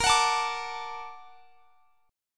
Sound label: Bb Augmented Major 7th Bb; Bb+M7; strummed; x,x,20,23,22,22